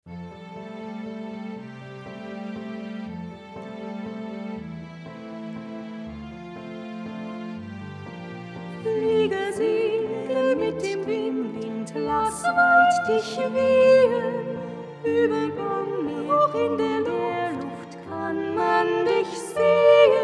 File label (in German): Sopran und Alt gemeinsam; musical ag